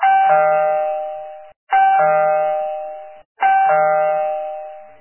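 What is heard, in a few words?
annoying doorbell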